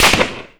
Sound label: Gunshot